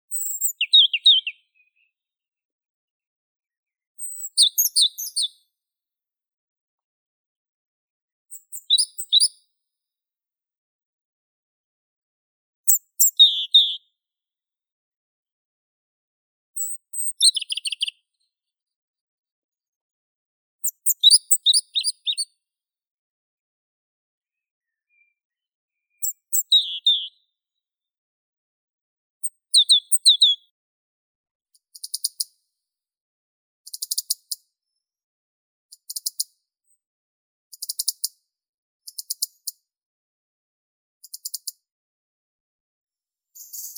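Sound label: robin Erithacus rubecula, nature sounds; malinovka zaryanka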